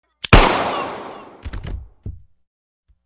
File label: gunshot